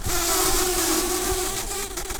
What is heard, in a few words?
bumblebees, Pascuorum queens